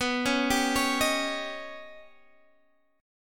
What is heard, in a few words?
Eb Augmented 7th